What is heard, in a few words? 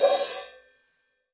crash choke medium, DRUMS (SINGLE HITS), Crashes